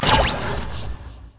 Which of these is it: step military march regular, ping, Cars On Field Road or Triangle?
ping